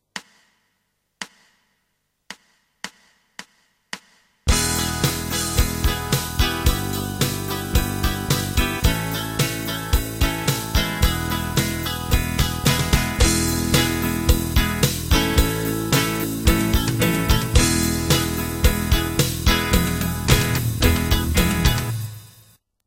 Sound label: E crotchets, guitar backing